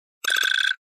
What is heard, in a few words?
Flash Gear Multi Bubb